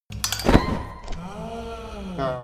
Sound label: aaaah